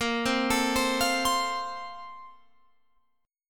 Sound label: Bb-Major 7th Suspended 2nd-Bb-down-Guitar-Open F; BbM7sus2 arpeggiated; JGuitar